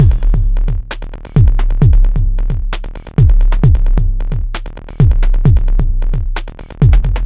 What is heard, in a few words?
Bpm132 Break, Dubstep, Drum Loops